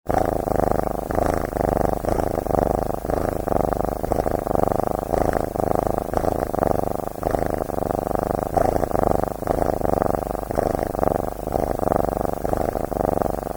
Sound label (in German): schnurr